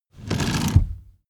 car seat slide forward fast

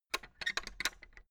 fence gate latch rattle long; objekte; tueren

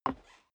step boots wood creak single stair down

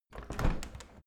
window handle open soft; objekte; fenster

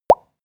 pop bubbles; soundpool

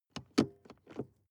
sports car int handbrake release long; verkehr-transport

